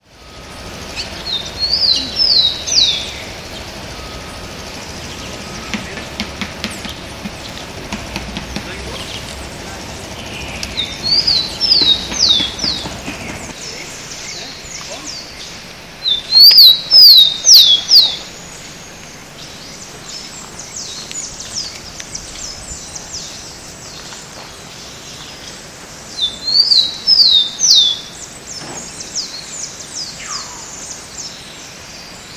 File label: Zonotrichia capensis; Pco das Antas Mongagua SP